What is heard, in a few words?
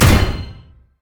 vortex; Diana